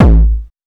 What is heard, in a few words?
kick